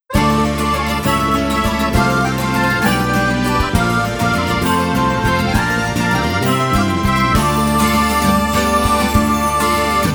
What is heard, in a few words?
Musette; Gust 25th Anniversary Best Selection CD Box